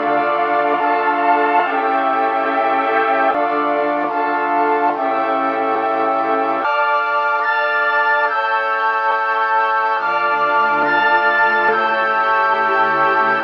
keys, jazz loops

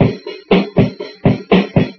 attitude, Drum loops Boombap

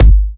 kicks heartbeats

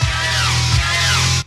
two stabs

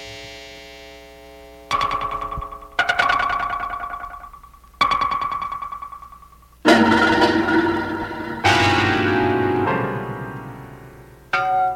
noises

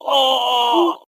"ah" pain, Voice

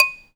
fork knife spoon, Percussion; Misc Percussion

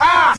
ah

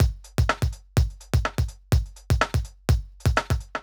Drum loops, Electro

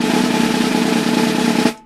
snare drum, drum loops rolls

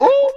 "ooh" male

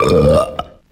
burp short

